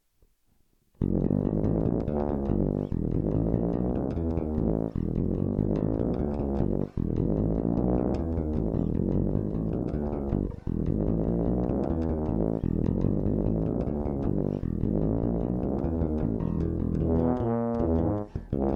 gr 20 2ch trombone, bass

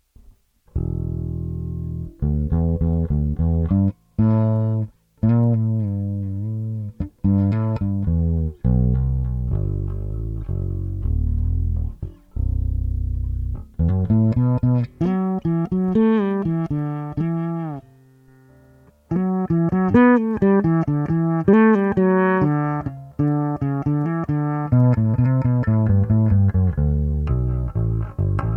fl ACTIVE neck, bass; electronics; SESSION

